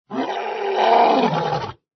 ANIMAL LION

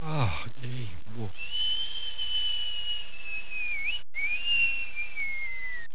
people

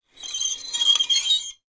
Metal Squeak

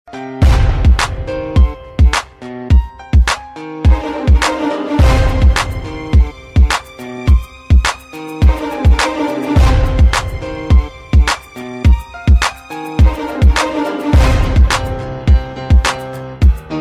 hip hop

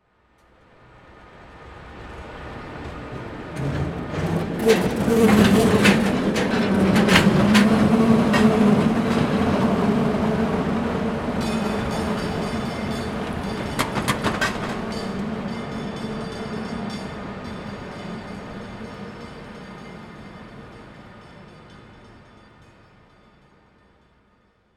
Old Streetcar Pass By

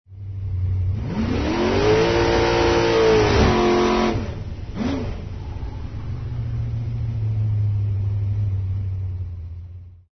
AUTO RACE DRAGSTER

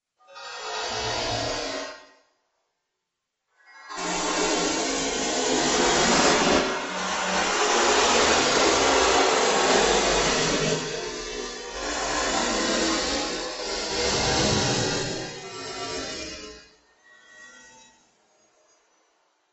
ambient

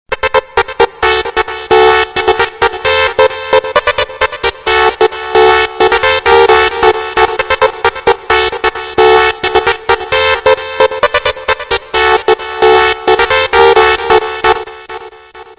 ringtone

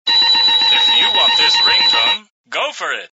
loud alarm ringtones

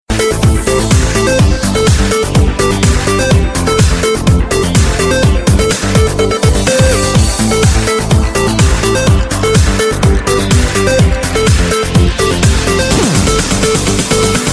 trance hip hop

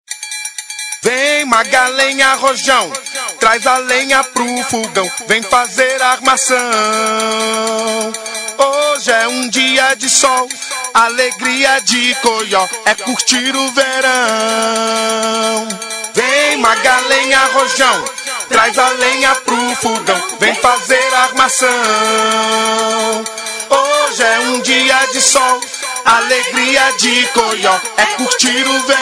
samba

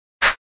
quick scratch; virtual dj

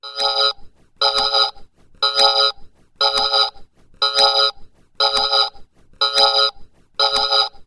scanner martian

